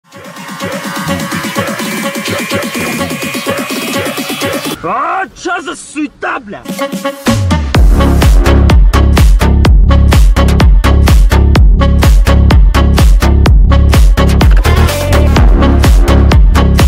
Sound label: bassboosted remix; tiktok